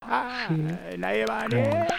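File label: Music Village Calm Inside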